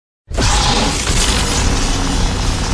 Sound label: Lightsaber Activatin